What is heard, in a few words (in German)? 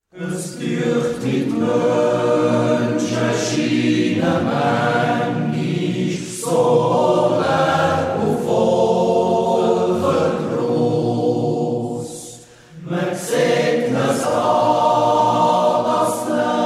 Chor